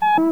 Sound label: close beep; Alachua County EMWIN System